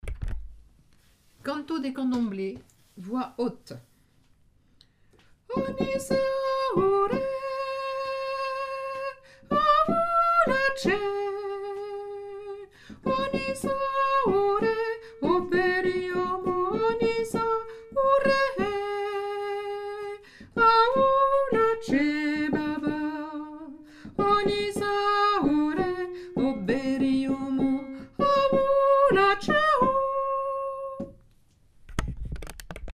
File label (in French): canto de condomble voix haute